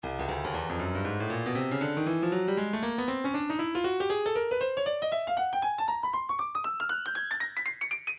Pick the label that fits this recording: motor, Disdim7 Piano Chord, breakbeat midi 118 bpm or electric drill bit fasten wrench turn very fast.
breakbeat midi 118 bpm